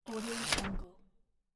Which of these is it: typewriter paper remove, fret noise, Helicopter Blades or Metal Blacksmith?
typewriter paper remove